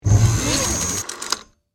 Mechanical Move, Mechanical, Movement, Machine, Servo, Motor, Transforming, Robot, Production Element, Imaging Element, Accent, Transition; Spacecraft